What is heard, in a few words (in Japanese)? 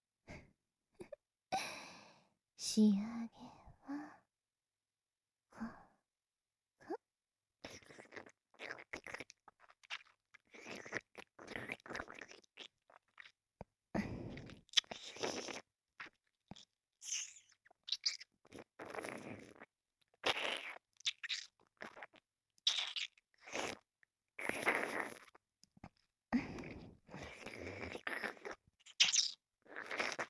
耳舐め se; ＳＥあり又は通常版